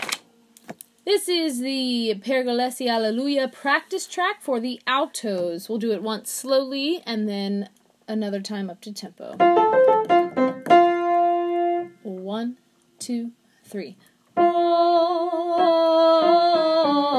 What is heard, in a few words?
Alto; Pergolesi; Alleluia